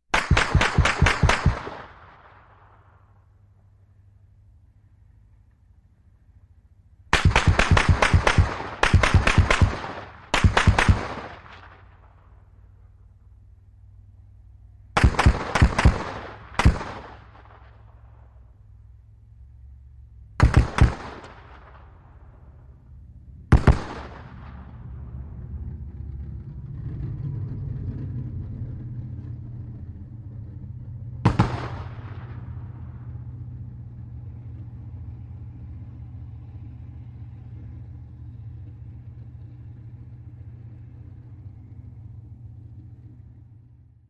.50 Caliber: Bullet Cracks From Distant Firing. Turret Movement At Tail.